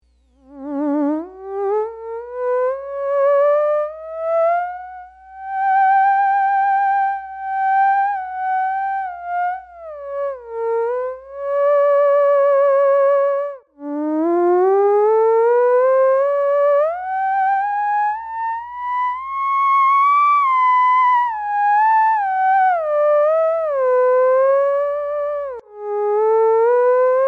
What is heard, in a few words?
theremin